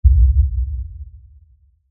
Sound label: Dinosaur Footstep, Impact; Animal; Lfe; Dinosaurs; Animals; BlastWave